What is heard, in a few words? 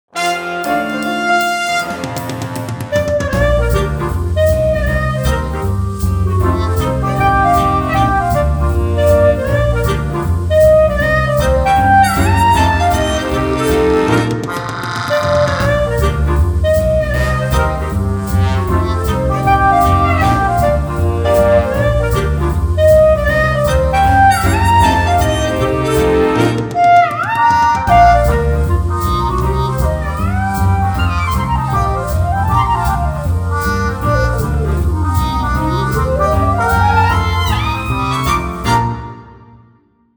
clarinet swing